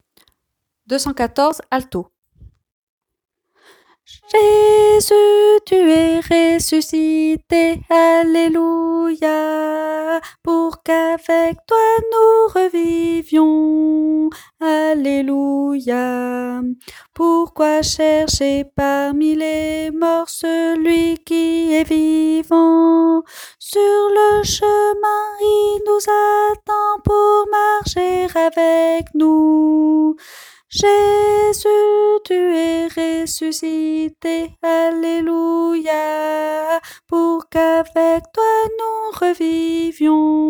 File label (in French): alto; 214 – Jésus, tu es ressuscité, Alléluia !